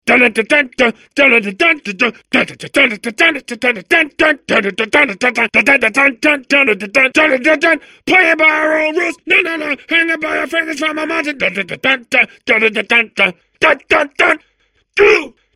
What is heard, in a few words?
singing; portal2